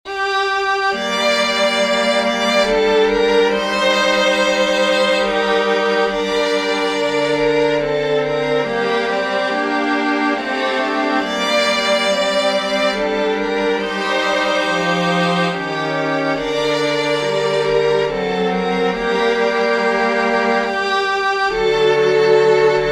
for string quartet; franck cesar; vieux noa from noa

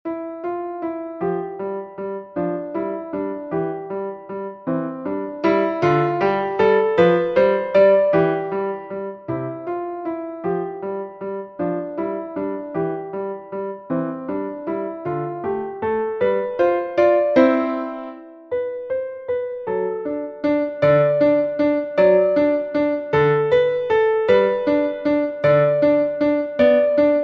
waltz; zilcher paul

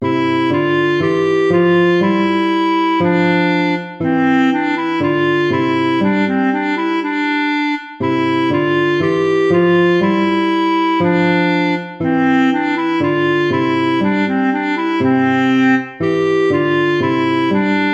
gavotta; hook james

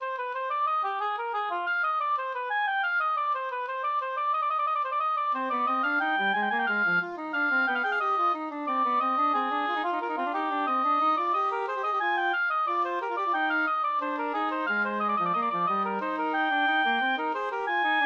invention for oboe english horn; bach johann sebastian

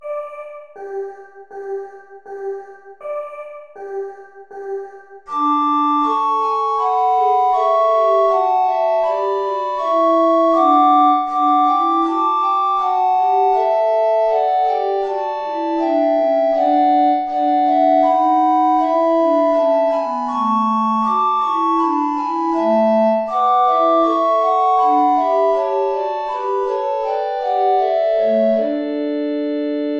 play along without tenor recorder; bach johann sebastian; christ est chantons choeur

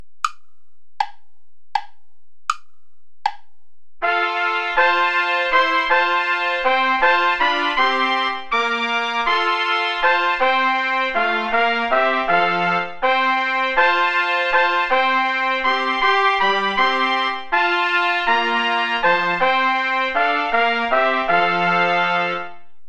play along without trumpet; herr jesu christ dich uns wend

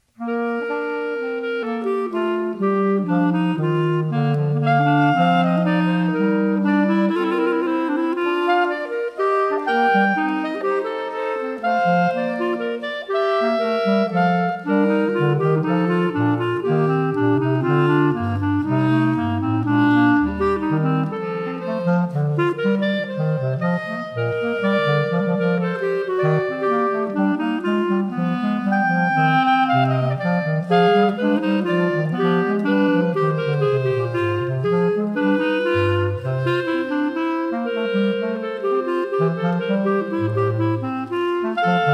sinfonia for clarinet trio; bach johann sebastian

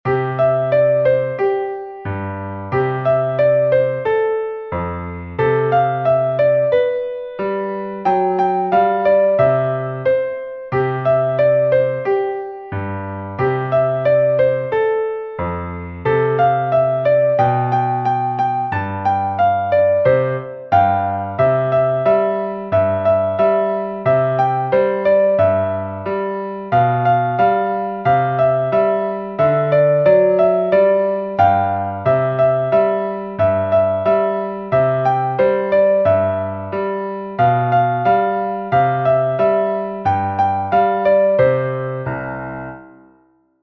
jingle bells; pierpont james

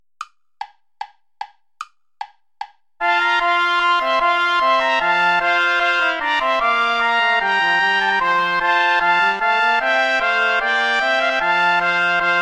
bourree play along without trumpet; praetorius michael iii

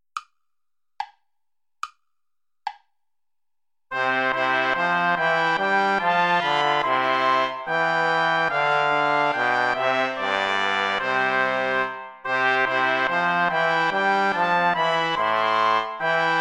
play along without trombone; praetorius michael; nach gra ner farb mein herz verlangt

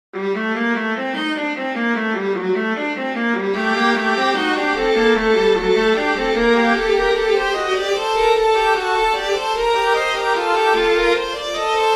fantasia minor for violin viola; bach johann sebastian

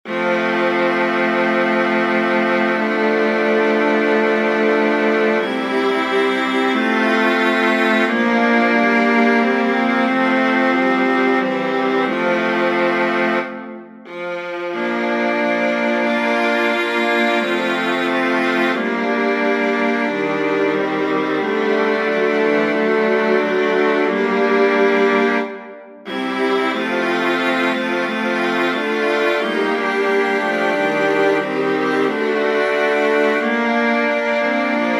for viola quartet; viadana lodovico; sacrum convivium

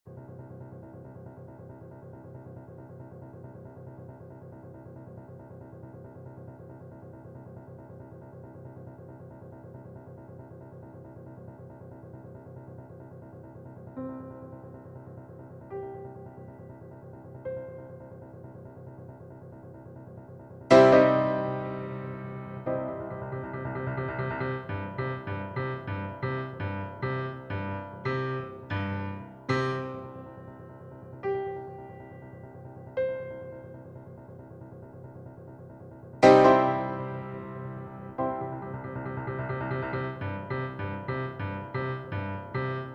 piano duet

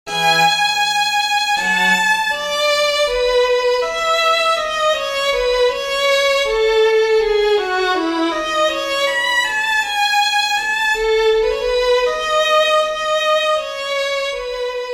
violin concerto, largo

